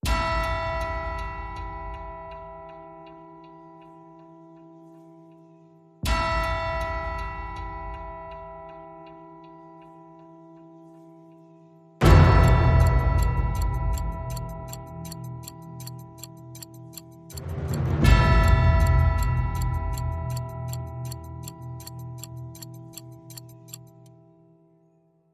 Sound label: Clocklike E